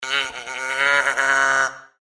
naughty; ZTE; Blade-A5-2020

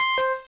Charger Disconnect; Palm Treo 800W

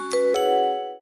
wink; Samsung Galaxy S10; AvatarEmojiSticker Beyond

